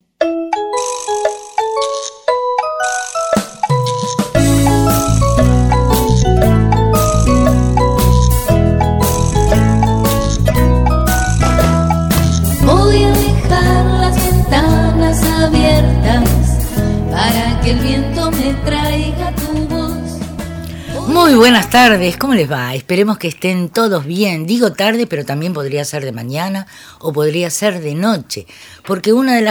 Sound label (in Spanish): CANCIONES DE ANIMALES